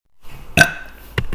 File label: rhoooo